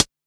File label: Quasimidi 309, Drums